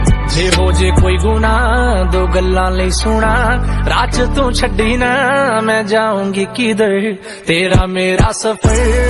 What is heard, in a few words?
Love Punjabi Song; ringtones